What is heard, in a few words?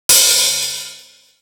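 Hi hat, Drum One Shots; AMG - Coldcut's Kleptomania VOL-1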